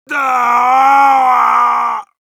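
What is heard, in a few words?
pain critical death; Heavy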